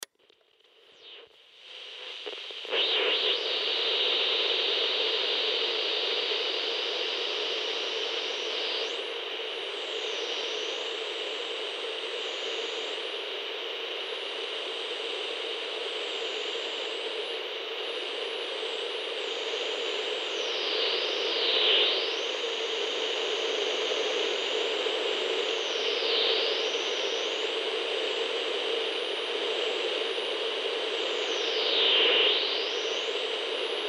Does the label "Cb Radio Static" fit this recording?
Yes